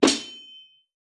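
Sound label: Barbarian, 部署音效; 野蛮人; 部落冲突; 皇室战争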